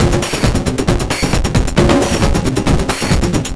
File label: distor shun, Deep House 135bpm